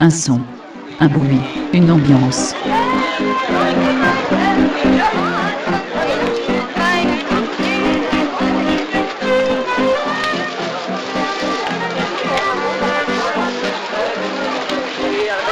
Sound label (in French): Un son un bruit une ambiance